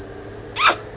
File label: kiss; Sound Library 2000; Wayzata Technology; People